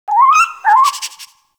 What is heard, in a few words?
Rabbit Ambient Call; Warframe; Duviri